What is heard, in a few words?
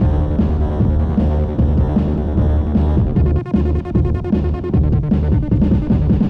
techno overpass, ambient